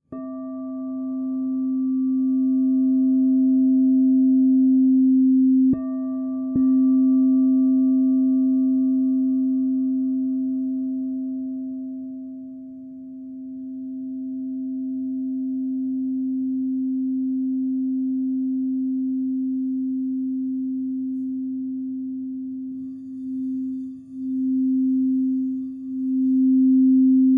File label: Crystal Tones Singing Bowls